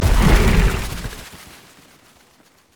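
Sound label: dark wind on hit; LoL; fiddlesticks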